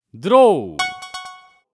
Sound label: Spring Draw; Seer; GamigoNA